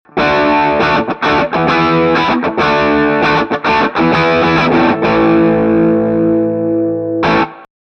Crunch EGit U67 Cardioid

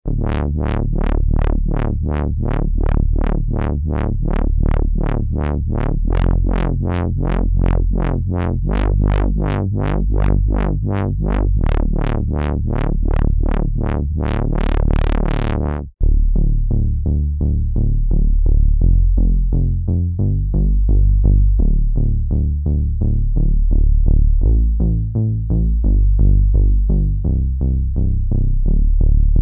LFO Bass

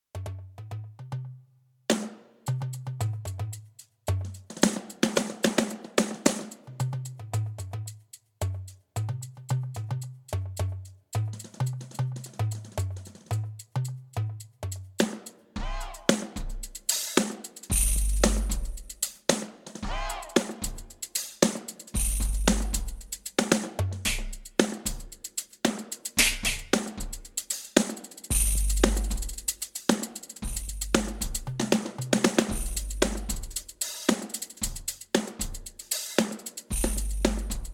YAMAHA DTX10K M Deep Safari

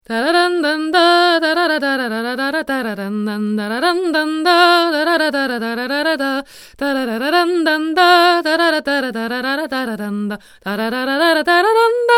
Sonotronics Saturn H2 far

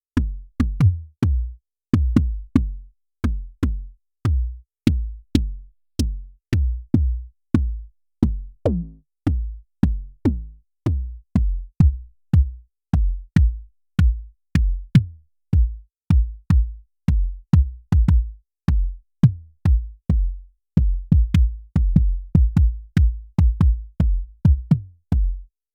Kick

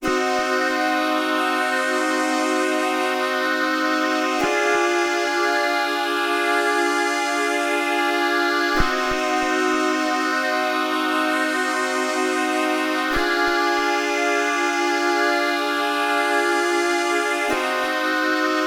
OB6 Factory Preset; portiert auf P6

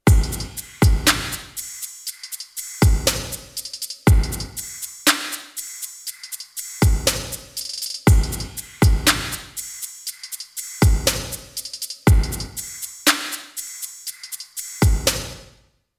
DRP 20 Drumloop Plate Gated

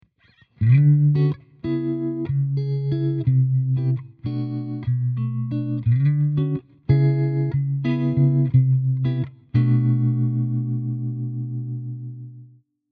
Clean + Tremolo

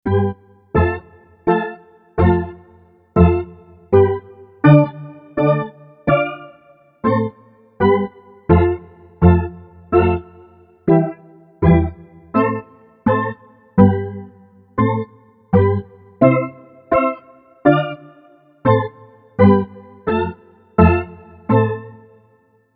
Steel Drums

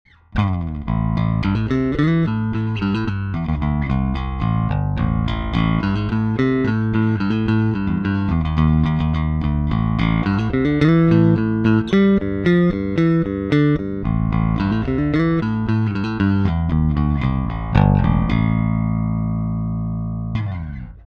Both Passive Pick

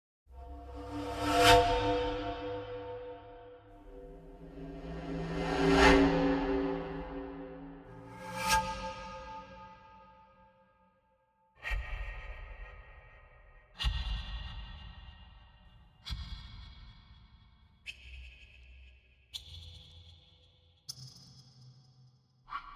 Swishes

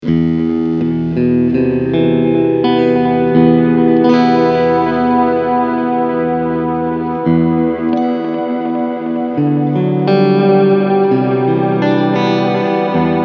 Texture

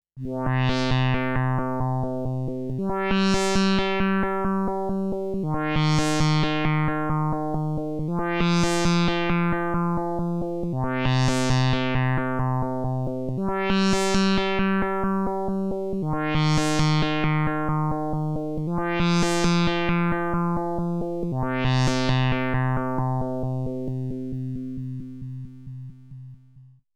Doepfer Dark Energy III PWM LFO Mod

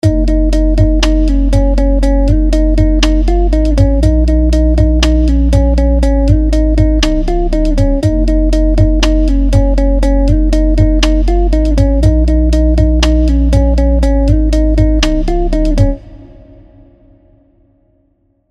Master Hip Hop Contemporary

